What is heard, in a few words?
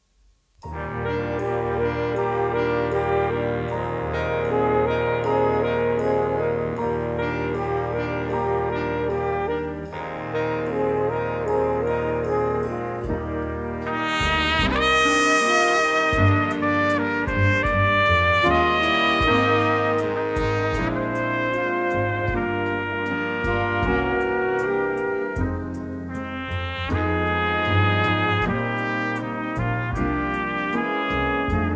jazz, Jazz Band Recordings